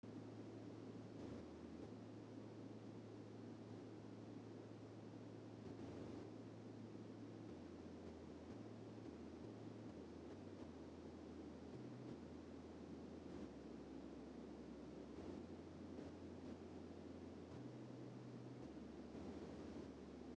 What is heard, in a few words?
Tape Noise, vhs; ultimate vhs pack; Videohive